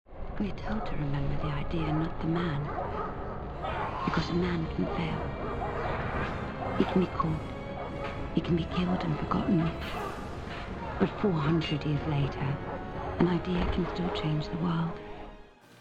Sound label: movie trailer